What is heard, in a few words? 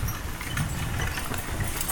Horse Drawn Wagon By; Electa Quinney